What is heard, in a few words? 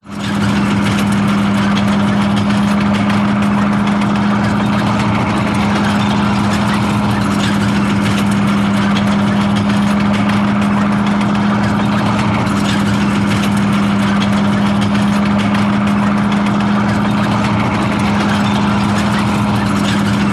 warfare tank matilda mrk 2 1939 drive med speed ext